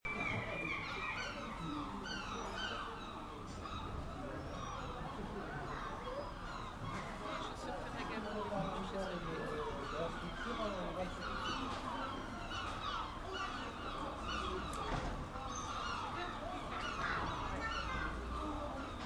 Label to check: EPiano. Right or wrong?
Wrong